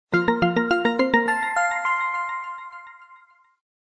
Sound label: Missed Call; KV500 Cookie